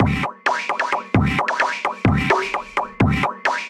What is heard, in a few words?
Balls 175bpm, MIDI Drum; Ableton; Factory Packs